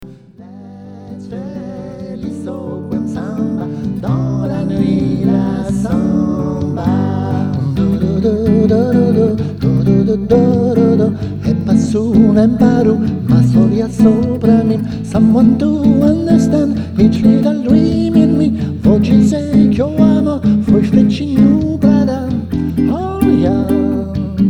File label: Samba